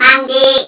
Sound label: funky